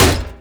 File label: slot spawn